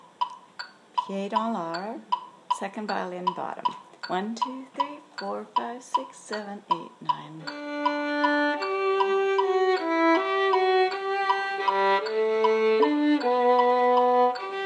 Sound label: violin